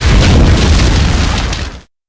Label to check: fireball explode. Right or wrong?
Right